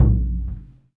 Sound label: SURDO; E-MU Producer Series Vol. 5 – 3-D Audio Collection; 3D Percussives; 3D Ethnics